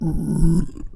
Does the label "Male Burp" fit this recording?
Yes